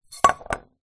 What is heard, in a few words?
food rice in metal pan set down on wood; zapsplat